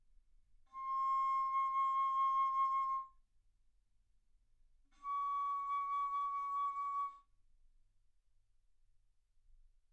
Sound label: Bass Flute C6 Db6; ff